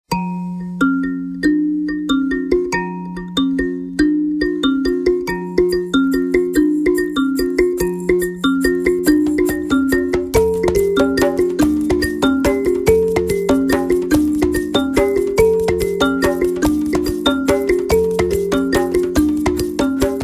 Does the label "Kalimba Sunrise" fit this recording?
Yes